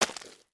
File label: Foot Mud Walk Light; footsteps